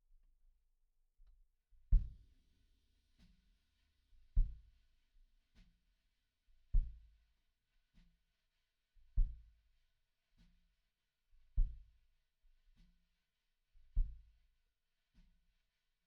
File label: Kick In